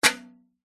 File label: vehicles bicycle pushbike metal mudguard hit impact clunk; zapsplat